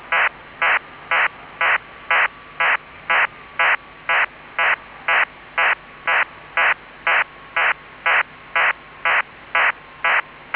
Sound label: ARCOTEL MAHRS 2400Bd, ALE; EADS/RACOMS